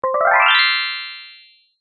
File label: kirarin